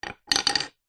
industrial hammer claw set down garage floor

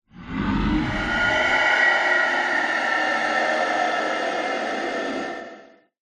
horror demonic growl huge growl wide

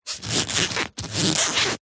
vehicles bicycle tyre squeak creak rub movement; zapsplat

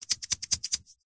timer sand hour glass shake; foley

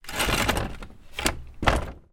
household table wooden garden drag paving

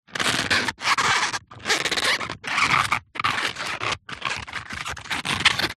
food bannanas rub together creaks squeaks

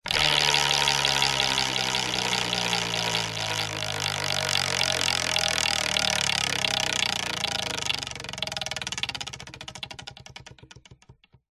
leisure wheel of fortune wooden spin fast

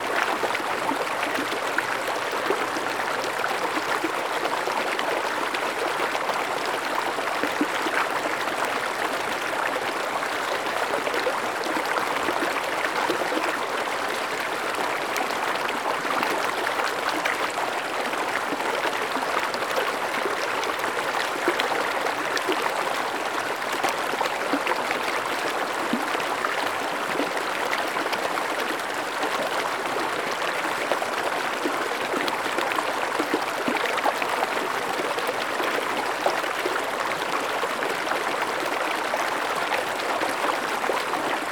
Stream Water, Medium Flow; lilesound

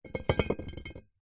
bottle glass 660ml full short wobble on concrete; foley; zapsplat

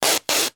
office parcel tape rip from roll x2 hard

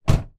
van door shut external; transportation; sound effects; sound effects one

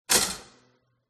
eject toaster; fox audio

